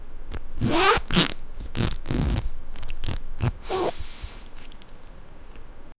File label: low tone wet fart grumbli; sound effect; body sounds